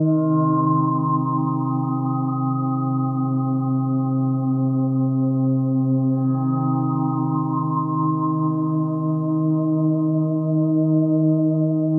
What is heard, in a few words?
SPHERE, D+B, 160, C; eJay